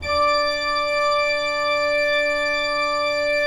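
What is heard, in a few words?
ORG D5, KEYS; Synclavier Sampler Library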